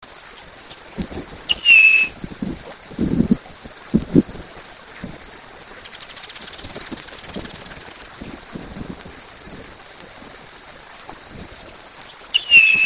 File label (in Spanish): federal